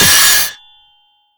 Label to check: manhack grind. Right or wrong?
Right